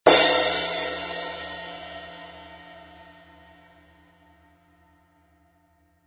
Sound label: 16in Medium Crash